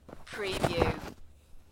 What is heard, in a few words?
Snow and Ice, Snow movement